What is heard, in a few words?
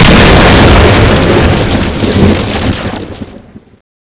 lance field attack in operation, weapons